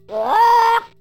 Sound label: bwaak; Animal Sounds; Various Animals; Short calls